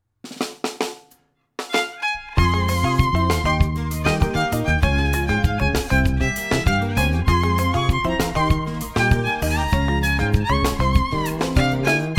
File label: The Violin Of Excited